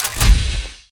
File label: strongbox open; garrysmod